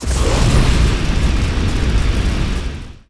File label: scorcher hit; Tanks